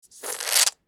Hair gel bottle squeeze

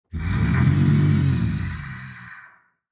Demon king lord growl grunt

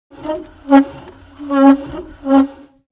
Wooden ship rudder turn creak; vehicle

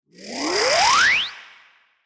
Anime riser accent

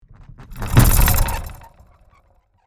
Industrial equipment metallic squeak

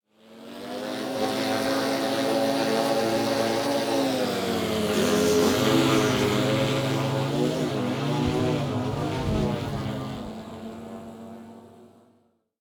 RC plane flying radio controlled; rc toys sound effects

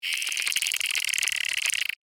Insect little monster chirping

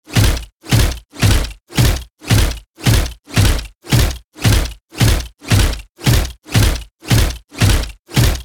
Robot support unit footsteps walking; robotic

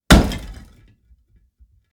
Big building block thrown on asphalt

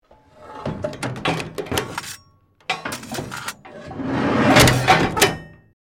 Mechanical metallic sequence gears movement

